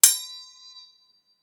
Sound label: Metal Ping